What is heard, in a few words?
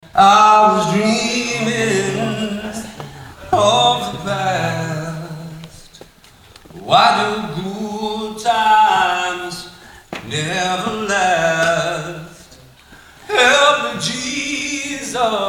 Acapella, Live